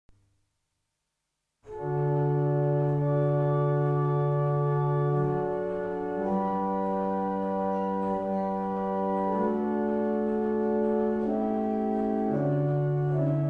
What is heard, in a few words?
Winchester New, barrel organs; Sutton Beds